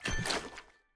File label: butter drop